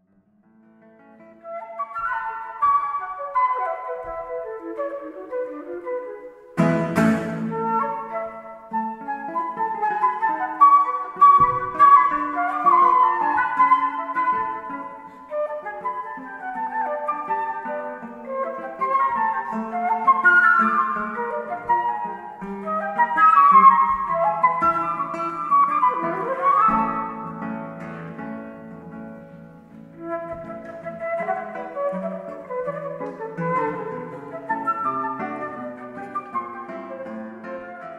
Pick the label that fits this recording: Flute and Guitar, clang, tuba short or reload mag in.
Flute and Guitar